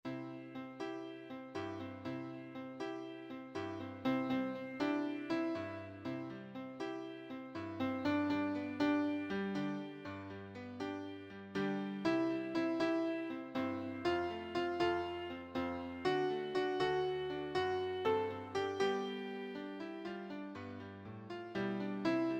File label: melody